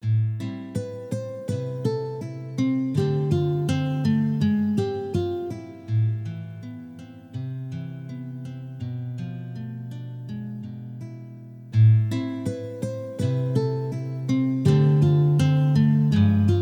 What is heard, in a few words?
guiter ver.